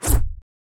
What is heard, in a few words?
punch real swing